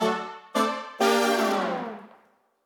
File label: Victim Rescue